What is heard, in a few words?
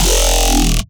synth